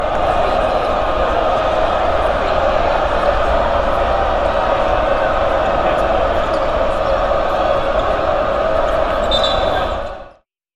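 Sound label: Crowd Jeering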